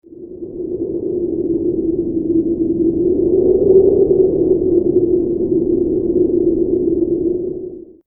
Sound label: Gentle wind